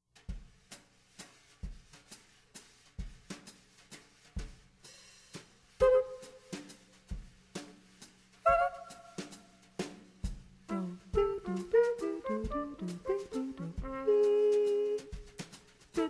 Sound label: Rhythm Waltz